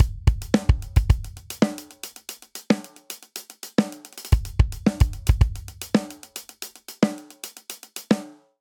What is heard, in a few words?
Classic Disco Breakdown 111 bpm, Drum; 4 4; MIDI Clips; Ableton; Factory Packs; Drum Booth